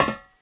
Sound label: metal, footsteps, walk